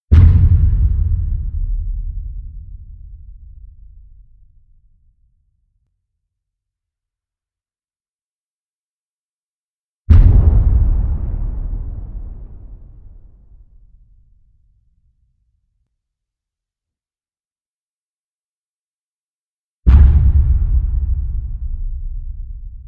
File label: sub booms dark shadow